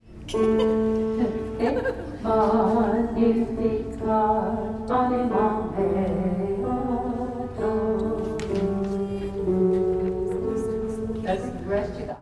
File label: Magnificat bass refrain